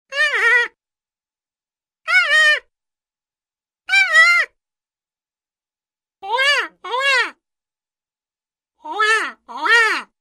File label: Muted Trumpet - 'yoo-hoo' - 'waa Waa'; Hollywood Edge Cartoon Trax